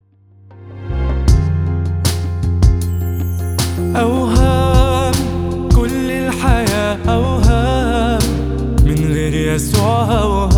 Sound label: Ringtone